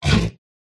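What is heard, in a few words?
tamer wound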